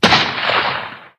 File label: Ambience Gun Shot